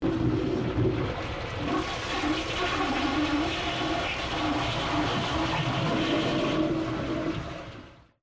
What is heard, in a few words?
toilet